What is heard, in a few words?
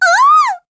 Inlove Oooh; Peatrice (in love): "Oooh!"; Skyward Sword; zelda